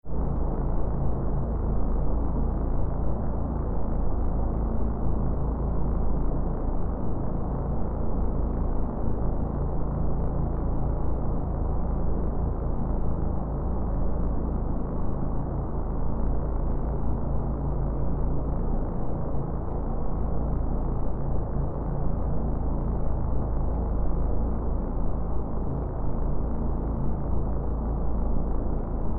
Spacecraft Interior SciFi Ambience Throbbing Motors, Spacecraft Interior Ambience Throbbing Motors Hum; Steady Hum Throughout; Drone Scifi Scienc; Spacecrafts (Sound Effects:Science Fiction)